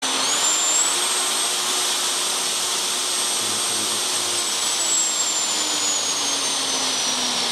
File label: ROUTER HITACHI M12V2; Mod M12V2